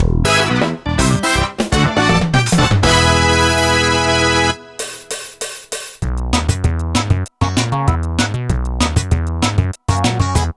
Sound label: Fanfare; Mario Kart Wii